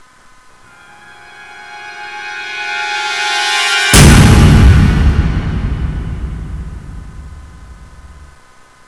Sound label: cutscene music